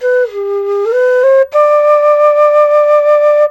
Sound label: SERULING, Heart of Asia